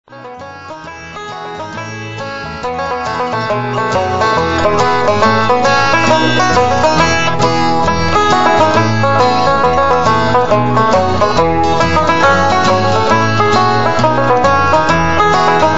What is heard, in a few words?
Hornpipe